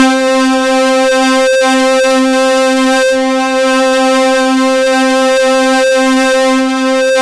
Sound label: C4, Synth Line; 90 s Sample CDs; NiceBeats; SynthLine VOL-21